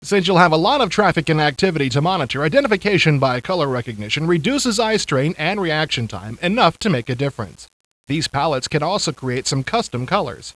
HARP

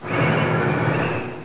AMB; ABUSE

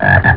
frog; ANIMAL

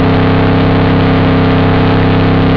SCREAM; MEGARACE

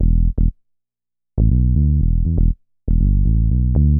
BASS, DANCE; MAGIX

